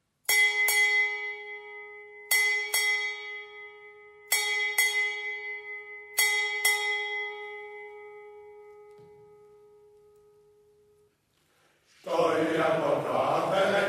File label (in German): acht glasen